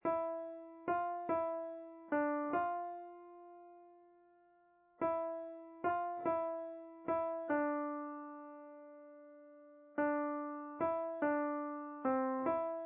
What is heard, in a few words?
Waltz